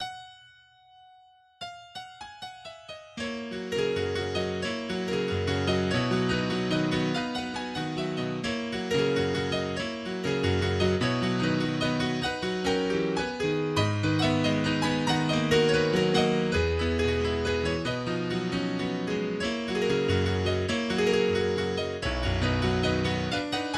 Prelude in B Major

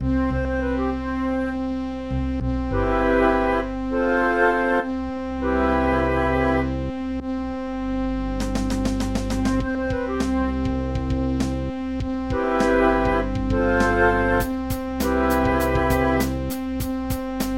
Groove

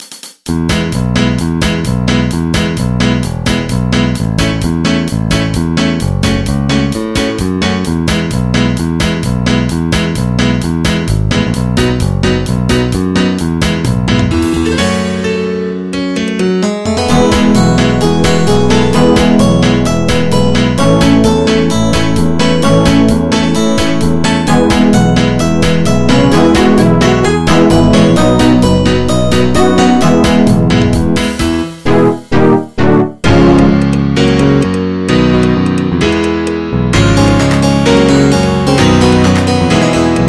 Doohickey Dance

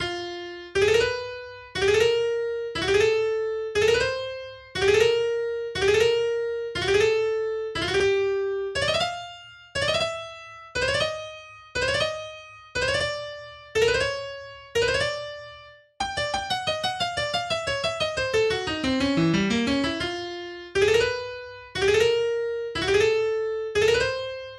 Battle Music

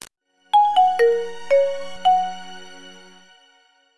Startup tone

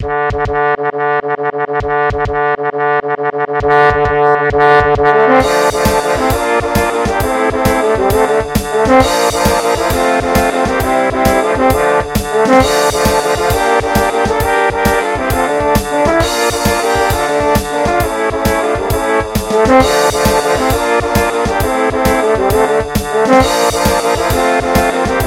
Trombone Quartet

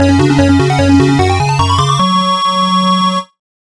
Sound Test

